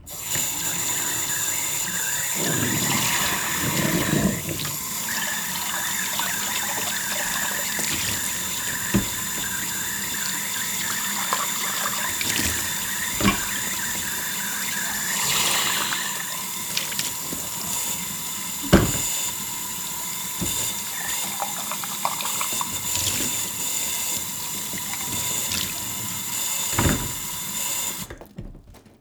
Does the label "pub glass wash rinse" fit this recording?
Yes